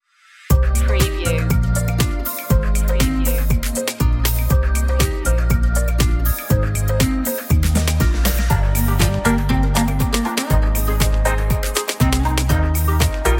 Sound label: tropical sun